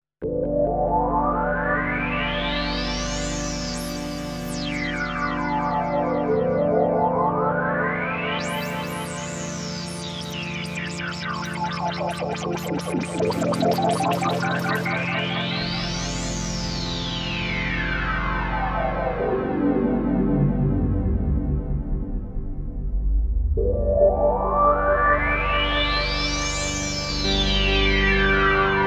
Korg DSS-1; main core based on 8 bits CPU Intel 8085 manages 8 voices polyphony

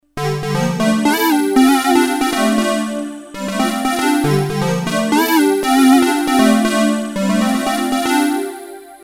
synth dual, emu carnaval

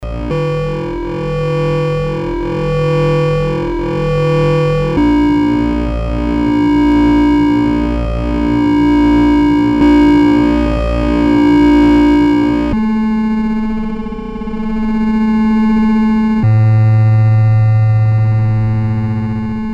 lofi, Moog minitaur

